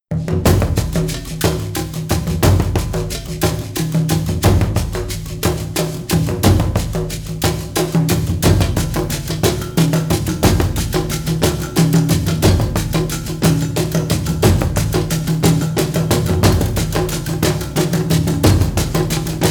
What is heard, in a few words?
Hawaiian Percussion Island Groove 120